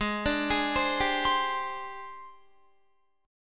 Ab Major 7th Flat 5th Ab, down, Guitar

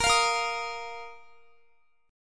Bb Suspended 4th Bb down Guitar; strummed; tuning 4E,4A,5D,5G,5C,6F

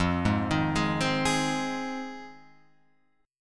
F Minor 7th F Guitar Open F; arpeggiated; JGuitar

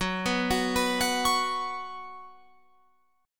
F sharp Suspended 4th, Guitar, Open F; arpeggiated; 18,18,18,18,21,20

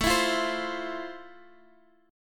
F Major 7th, A

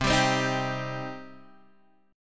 C Minor, C, Guitar, Half Step Down

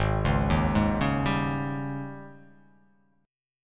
Ab Major 7th Suspended 4th Ab down; arpeggiated; JGuitar

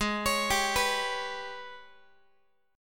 G sharp Minor Major 11th, Guitar; arpeggiated; x,16,16,17,15,x; 4A,4E,5A,5D,5Gsharp,6Csharp; G#mM11

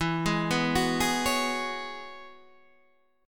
E 6th Add 9th E down Guitar Half Step Down; E6add9

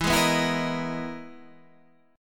E Major Sharp 11th E, Guitar, Standard; EM#11 strummed; JGuitar

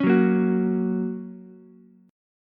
Bb Minor Sharp 5th, Ukulele; strummed; chord shape; 6,6,6,9

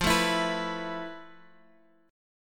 F Major 7th Flat 5th, F, down, Guitar, Open F; strummed

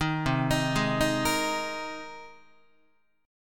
C Minor 7th Sharp 5th C Guitar; Cm7#5 arpeggiated; JGuitar

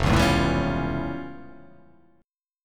F Augmented Major 9th F

